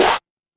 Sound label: retro game power up